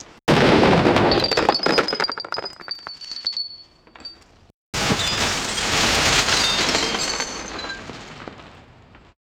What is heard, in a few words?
detailed sound, debris